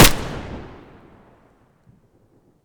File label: ak47 distant; garrysmod